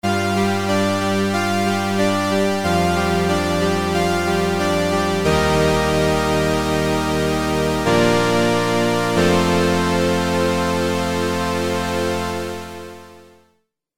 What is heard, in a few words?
Juno Pulse Pad